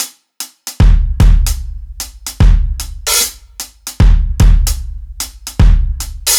Rimshot Kit, регги

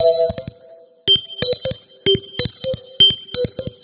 Old Org, орган

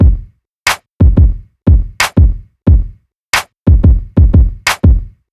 Cow Bell C

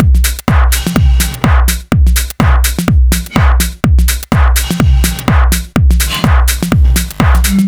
Bottleneck A

